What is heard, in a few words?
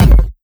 bd noises; drums pads